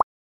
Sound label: bubble burst